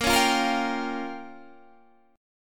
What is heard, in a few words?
Db 6th Flat 5th Bb